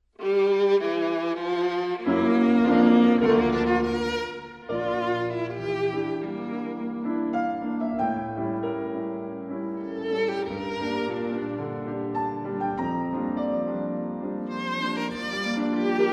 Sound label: viola son; bantock